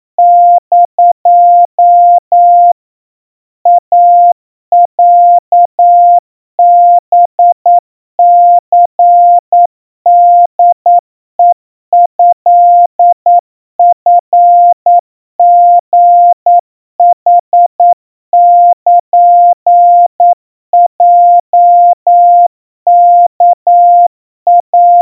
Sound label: iroha 30 cpm 700 Hz Crispy, Morse